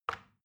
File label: plastic button tiny release regular